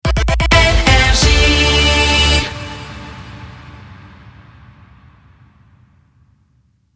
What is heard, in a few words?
Jingle Rapid Fire; NRJ